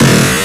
breakbeat

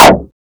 Kick hardstyle; 梁非凡

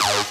wobble

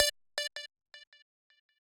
lead

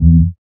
E3 Bass Pure

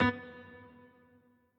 piano riff intro

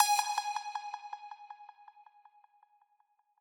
pluck

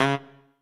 Trumpet melo

